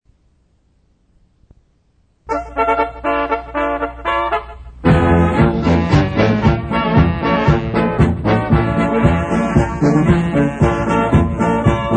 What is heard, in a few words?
SHARPSHOOTERS POLKA